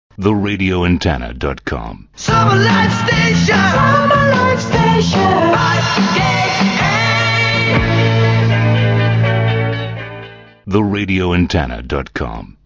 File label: Aircheck Jingle Summer; 5KA; 1975